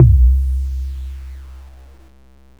BONGO; KillerTweaksII; DirectWave

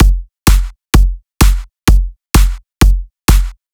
drum loop 128 Strp; Electro; drum loops; Anthemic

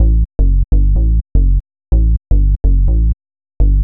HOUSE BASS 125 A

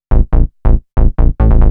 TECHNO BASS 140 A

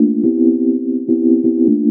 house synth 125 A, keys

